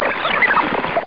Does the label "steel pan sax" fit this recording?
No